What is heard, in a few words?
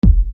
Bass Dr, EuroDance Bdrums